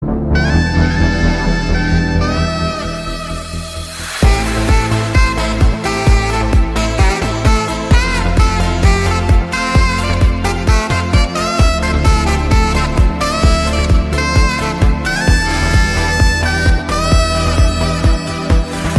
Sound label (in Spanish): Saxofonista